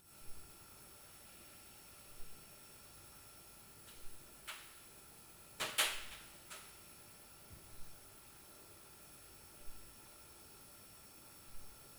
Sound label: TiK TIK TAK Tik, Night Noise